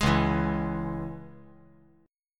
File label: Db Minor Db; strummed; JGuitar